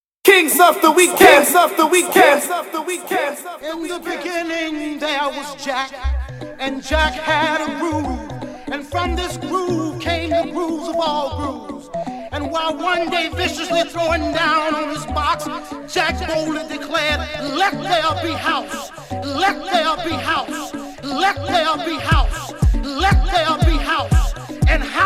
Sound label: Classic House Mix